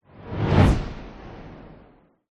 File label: sweep transition fast whoosh airy